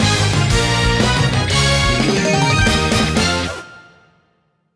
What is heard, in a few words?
WHITE JINGLE WIN; The Magic Nightmare; Android